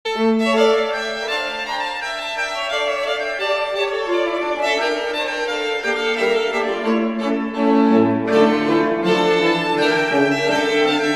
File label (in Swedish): senpolska trio